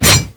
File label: Ghoul Devourer Melee Swipe